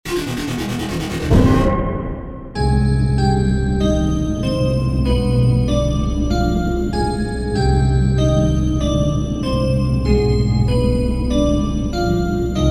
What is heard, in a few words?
Game Over Theme; The Hungry Horde; PS Vita